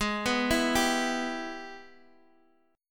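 Ab Minor Major 7th Sharp 5th chord, Guitar, Standard tuning; arpeggiated; x,x,6,4,5,3; JGuitar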